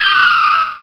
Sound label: gryphon hit; wesnoth